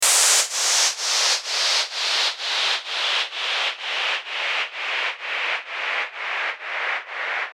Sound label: Tremolo